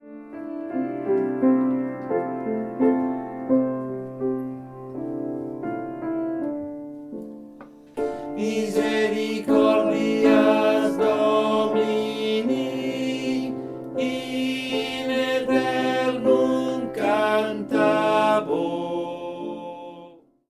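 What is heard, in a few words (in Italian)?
Misericordias Domini, tenori 1 voce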